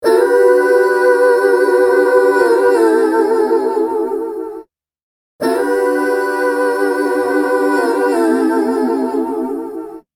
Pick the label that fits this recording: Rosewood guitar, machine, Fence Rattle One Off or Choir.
Choir